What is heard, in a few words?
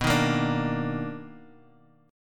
B Major 7th Sharp 9th B; Bmaj7#9 strummed; JGuitar